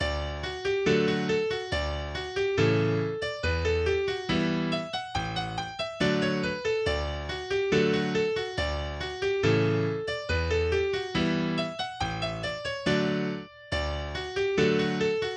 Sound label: West Hornpipe, english dance tunes; eighty english dance tunes